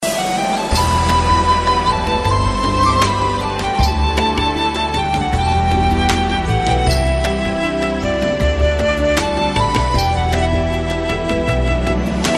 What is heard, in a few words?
Soft Tune